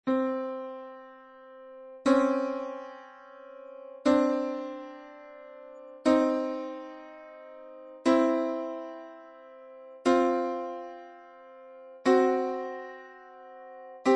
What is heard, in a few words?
Intervals